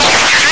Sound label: spin loop hurt, manhack; hl2